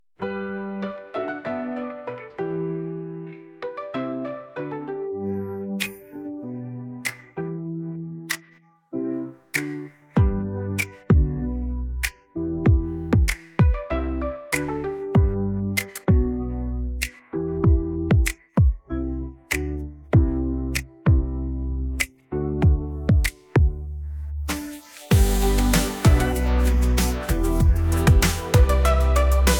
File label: Instr; Czesta; piosenki dla dzieci